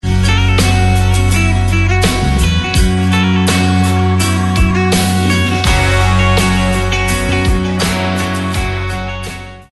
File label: Electric Ringtone